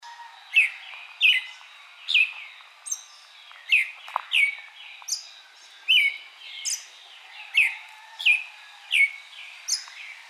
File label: Eastern Red legged Thrush